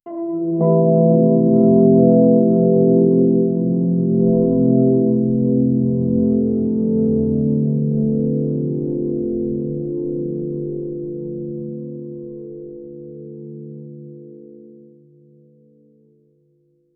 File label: mysterious slow outro low, ambient synth